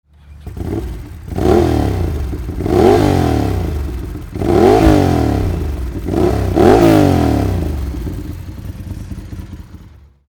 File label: ducati 748 startup